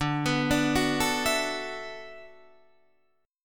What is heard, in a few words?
D 6th Add 9th D, Guitar, Open D